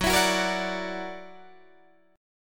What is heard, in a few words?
Gb Augmented 7th Gb down Guitar Open D